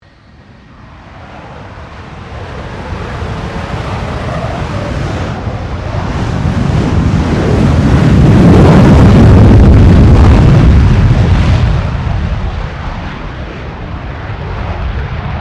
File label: FA-18E Superhornet takeoff; RAAF FA-18E Super Hornet; Airplanes; Vehicles